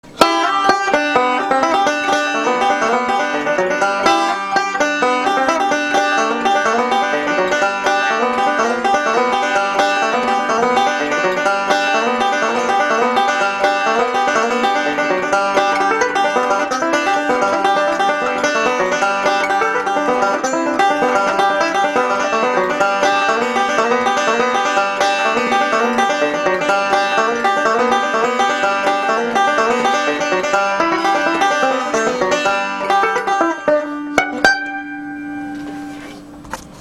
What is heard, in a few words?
5-string Banjo playing; Cripple Creek